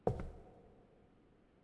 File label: Footstep Wood